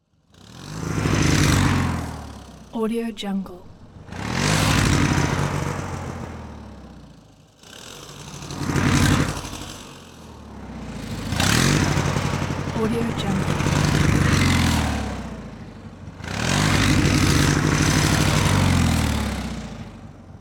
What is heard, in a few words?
motor rev by pipe